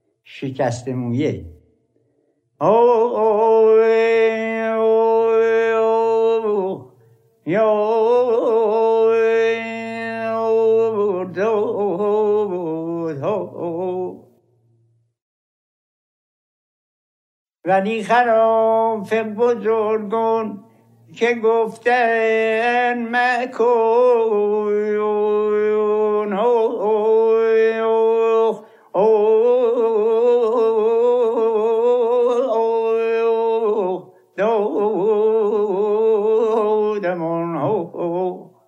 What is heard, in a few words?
shekasteh mouyeh, seghah